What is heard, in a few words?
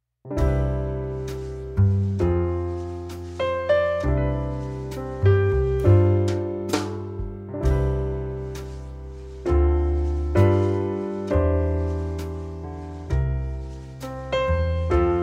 Jazz Hn F